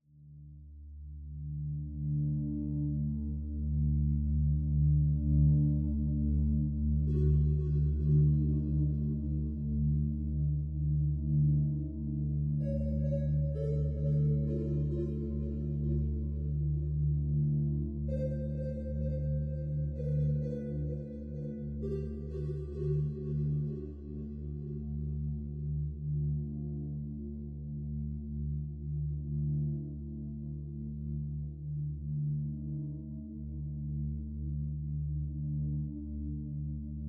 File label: ambient